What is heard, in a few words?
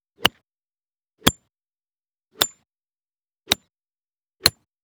golf club rescue mono; rescue 5; soundscrate